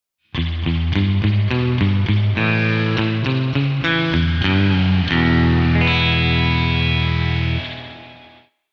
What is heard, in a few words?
guitar Reverve spring